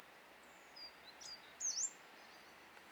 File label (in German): gelbbrauen-laubsaenger